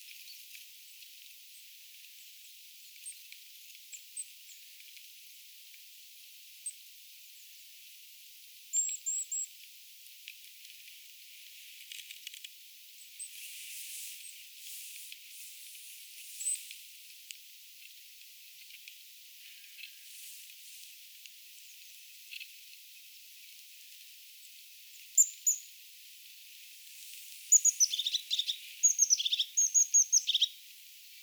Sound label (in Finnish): ilmeisesti myös tuo hippiäismatkinta on töyhtötiaisen